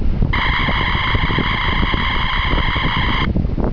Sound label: phaser